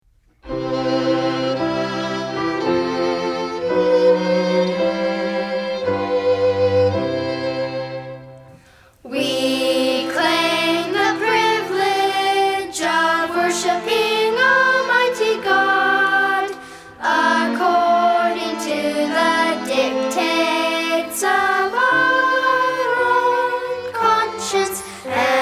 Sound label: words and music